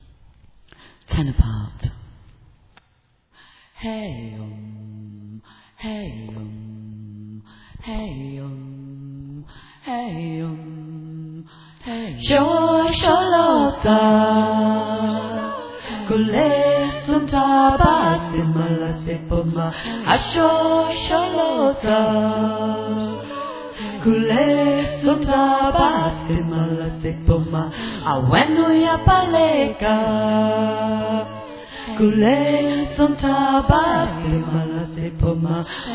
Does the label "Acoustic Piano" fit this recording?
No